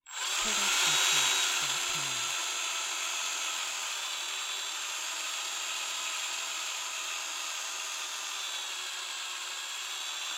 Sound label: drill fast long; SoundsCrate